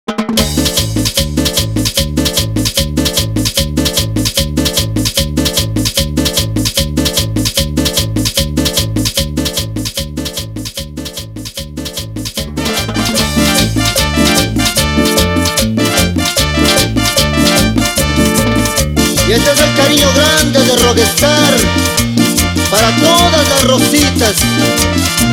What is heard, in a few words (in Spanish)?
Intro Simple Bpm 150